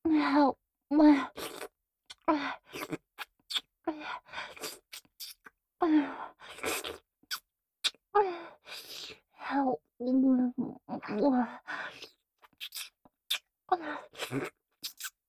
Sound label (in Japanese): フェラ舐め